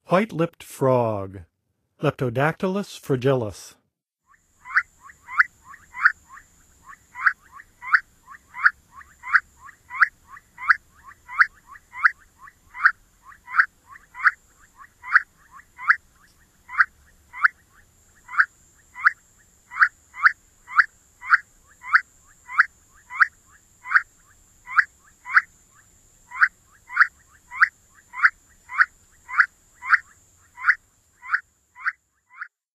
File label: White lipped Frog; North America; Songs of Frogs & Toads of North America